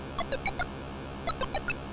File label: Critical Stop; Atari 2600